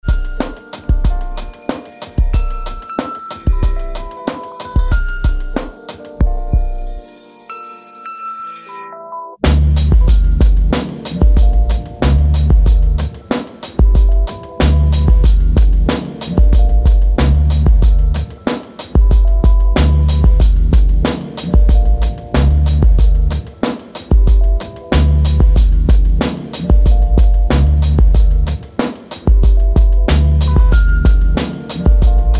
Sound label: Instrumental